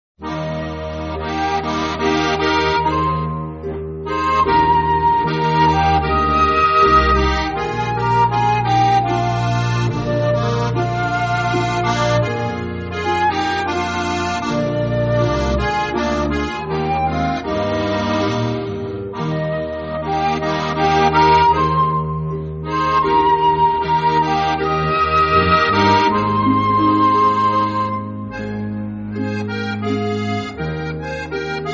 Landler

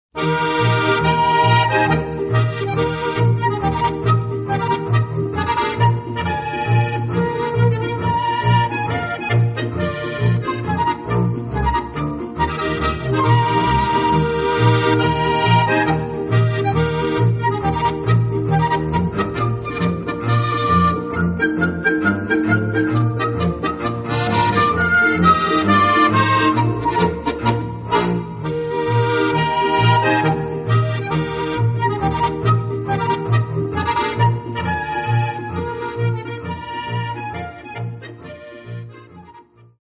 Polka

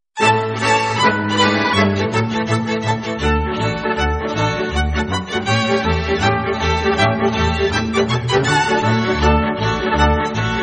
Galopp

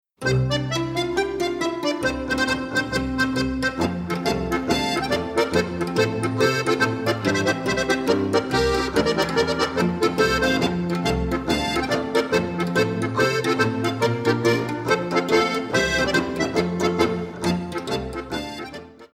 Polka schnell

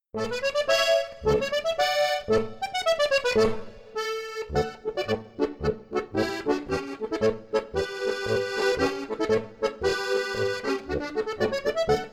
Schrotstein Polka, Harmonikaduo

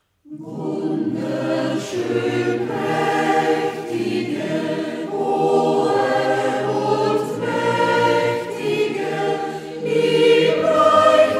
Chormusik

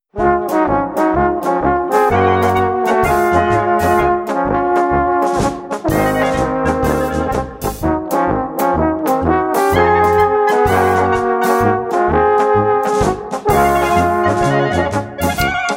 Polka, Blaskapelle

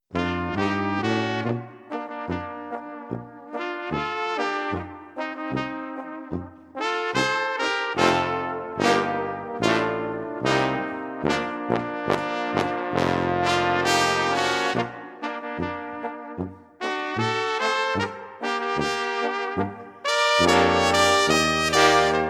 Polka

